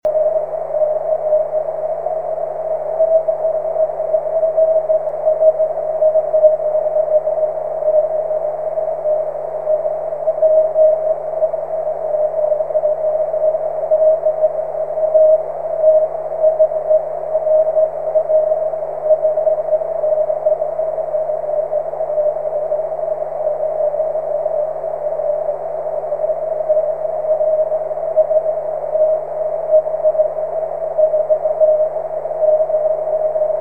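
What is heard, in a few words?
13cm continuous wave; pa0bat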